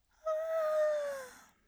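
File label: voice yawn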